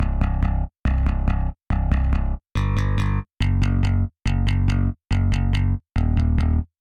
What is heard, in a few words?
Drums and Jingles Explore Bass